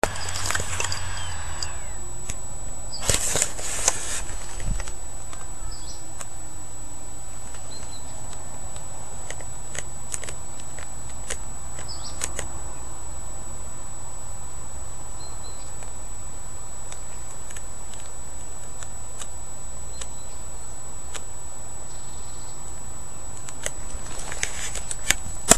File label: humei, phylloscopus humei